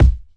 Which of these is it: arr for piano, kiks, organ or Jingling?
kiks